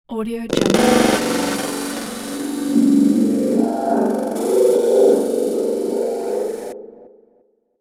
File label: sound distortion